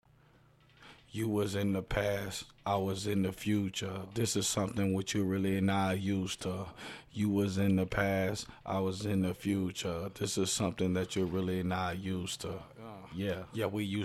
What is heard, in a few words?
hip hop, 99, Abm, acapellas